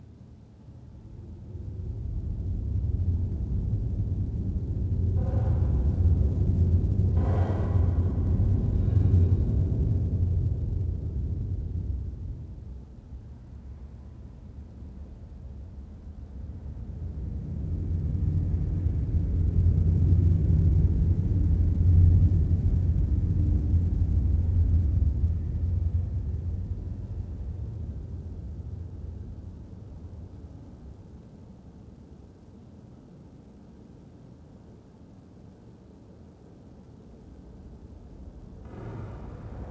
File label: Subway Station; noise; Max Payne; XBOX